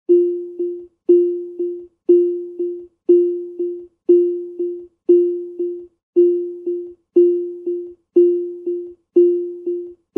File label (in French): Tesla sonnerie